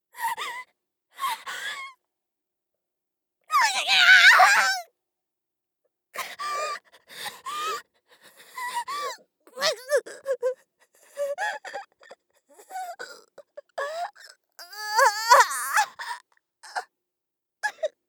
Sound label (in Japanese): 切断; 効果音なし